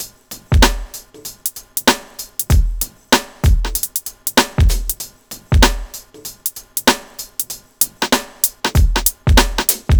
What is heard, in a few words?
Hi Hat Jazz, 96; Hip-Hop